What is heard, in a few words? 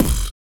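vox percussion, Vocal Planet Jazz FX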